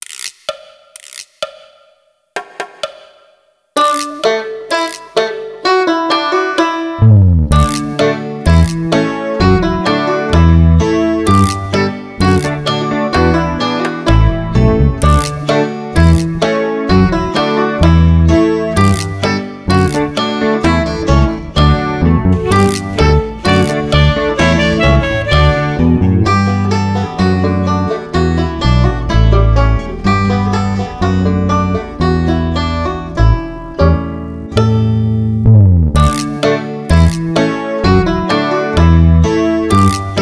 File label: Instrumental